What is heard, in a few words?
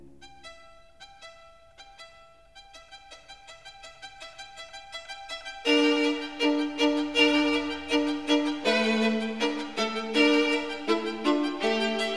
String Quartet, Humoresuqe (Allegro)